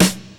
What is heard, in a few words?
sfx perc